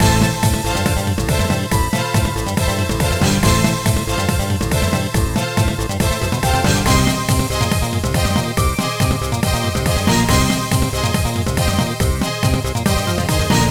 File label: Bgm boss scene; Cookie Run; memorise